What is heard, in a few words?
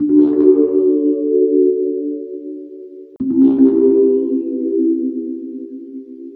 Keyboards, Drum & Bass, 170, C; Total Drum&Bass; 90 s Sample CDs